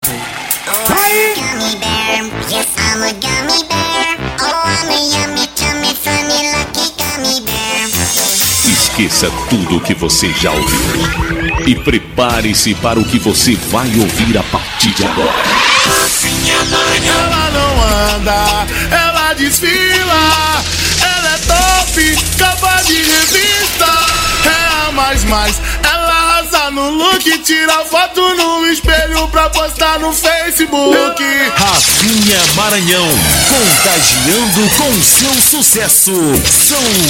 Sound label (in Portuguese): abertura, ao vivo